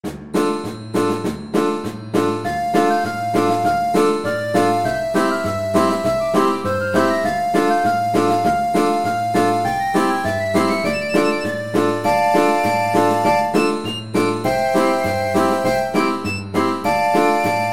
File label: drunken sailor piano